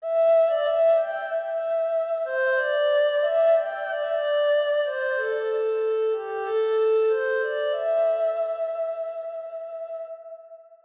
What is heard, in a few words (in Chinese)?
二胡独奏